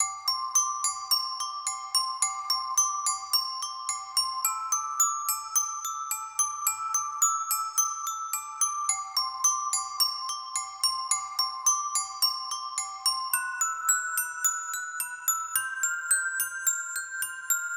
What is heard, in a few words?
glockenspiel (loop); B Path of the Teal Lotus